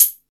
Raw Hat F# Key, Royality free closed hi hat sound tuned to the F# note. Loudest frequency: 9913Hz; drum single hits one shots; hat samples